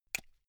buckle plastic open

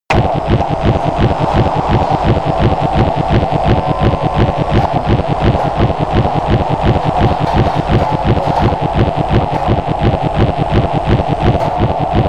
atmosphere industrial hypnotic high

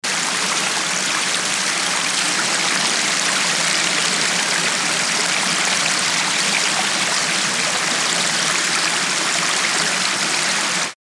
city park fountain closeup

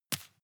juggle catch soft

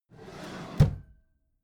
empty drawer smooth open regular

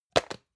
plastic can small lid close hard

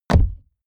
horse step wood

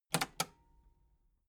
metal button cassette rewind fast

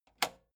metal button spring push

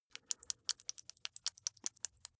flesh wet fast squish

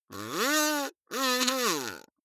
kazoo talk triumphant

